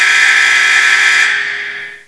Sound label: time up